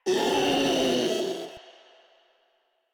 Infested Ancient Vocal Attack; Enemies; Proto Infested Ancient; Warframe; Ancient Ninety Nine

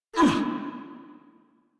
Pain, Grineer Male

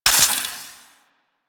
Quill Dart Gun Poison Mech, Weapons; Warframe

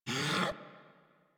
Puppy Idle Jump; Enemies; Vocals; Kubrow; Warframe

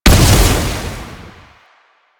Proj Impact; Duviri; Incarnon Adapters; Incarnon Dera Vandal; Weapons

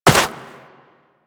Operator Run Snow, Footsteps; Warframe

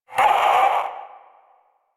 Possessed Red Veil Vocal Pain; Warframe; Priest Quest

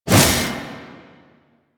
Mace Whoosh; Warframe; Duviri; Duviri Dax; Weapons; Duviri Mace And Shield

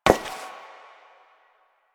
Step Metal; Warframe; Koumei

